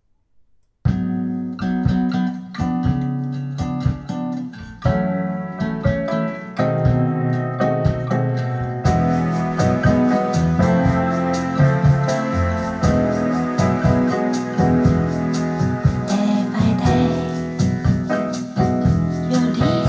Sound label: tube NF OFF